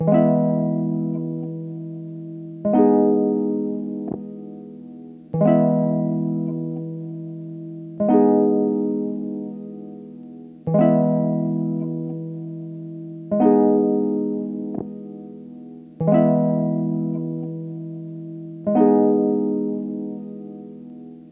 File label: Harp Loop Dry 90 BPM D Maj; Melodics; Cymatics - Eternity Sample Pack